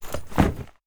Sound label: sledge holster; melee weapons; tfa starwars melee weapons pack